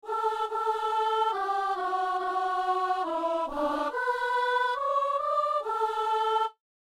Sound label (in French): psaume, soprano; Psaume 121 (122); Messe du 17 janvier à Saint-Denis